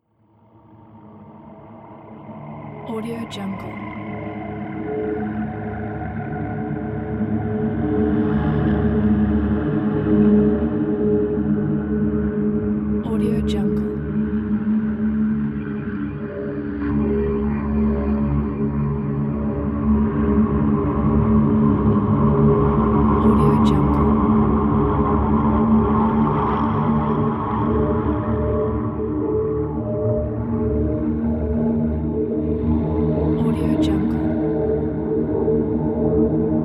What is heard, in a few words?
dark soundscape drone